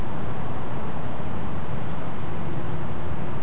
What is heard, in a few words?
Red throated Pipit two calls; nocturnal flight call; Beijing